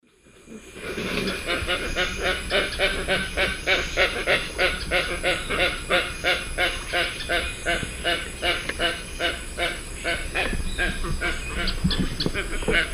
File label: Opisthocomus hoazin, calls; S. America; Eastern Lowlands